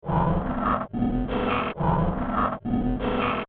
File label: Broken Retro 8 Bit Loop; Computer Sound Effects; electronic sound effect; beeps, bleeps, blips